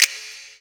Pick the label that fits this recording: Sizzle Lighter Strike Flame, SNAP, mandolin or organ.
SNAP